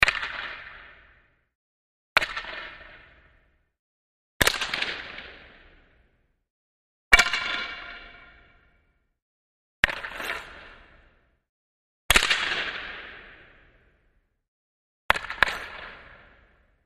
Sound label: Hockey Stick Board Im, hockey stick hits board; Hockey: Stick, Board Impact ( 7x ); Hockey Stick Hitting Board / Ice